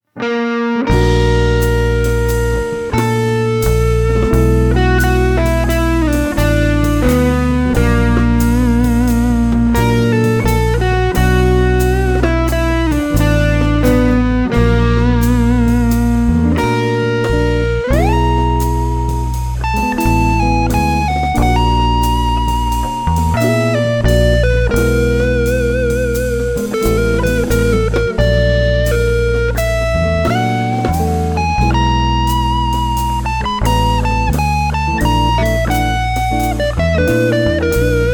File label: enigmatic major